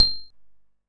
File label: Xylo C5; multi-samples; CHIPSHOP DELUXE